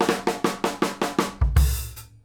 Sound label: FILL